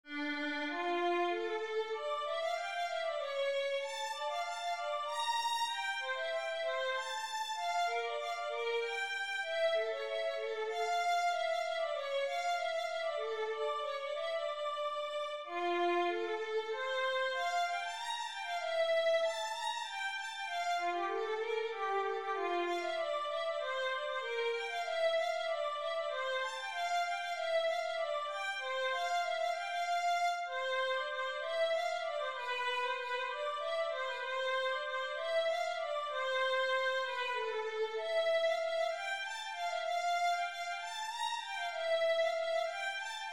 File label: rondeau violin